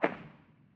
Footstep

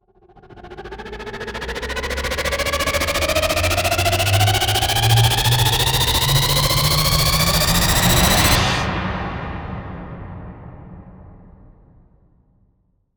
Riser

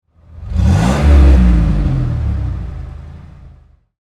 Loud Car; Cars

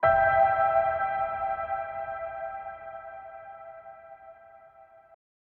Piano Reverb High Fluctation

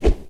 Punch Whoosh; Hit Whooshes

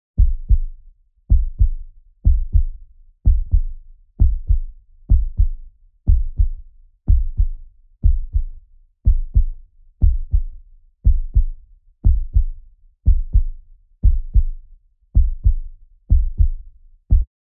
Heart

